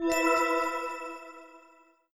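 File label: puzzle shore solved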